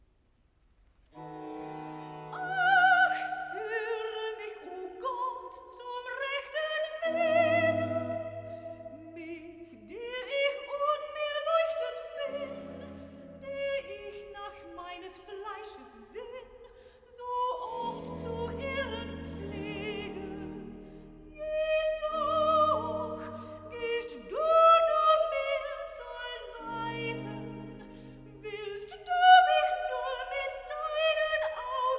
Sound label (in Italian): Recitativo (Sopran); Ach fuhre mich o Gott zum rechten Wege; BACH; BACH for SOPRANO